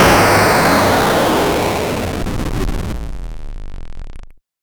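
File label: 16 bit retro game explosion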